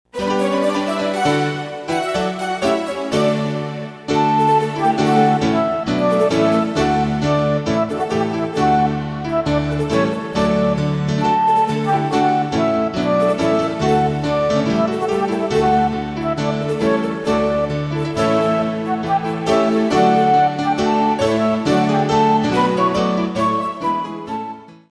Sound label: Instrumental Voice Of Christmas